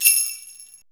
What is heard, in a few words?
SLEIGH BELL; Zero-G Ambient 1; PERCUSSION